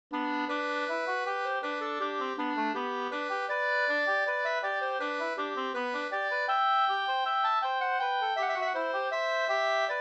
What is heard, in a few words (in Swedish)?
ganglat; Ove Frid